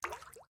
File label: ripple touch release, phonetones, ui; LG Optimus G F180K